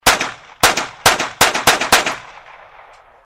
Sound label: Gun Shots